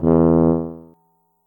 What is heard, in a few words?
tuba note